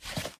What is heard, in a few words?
skip walk